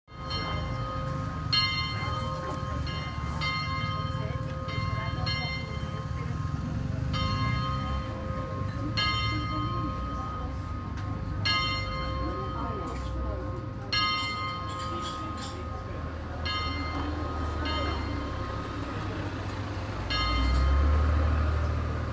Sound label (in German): glocke; ranft